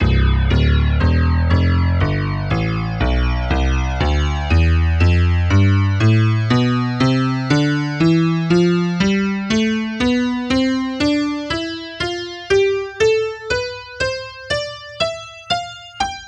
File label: honky tonk; tonleiter; SpectMorph Evaluation #4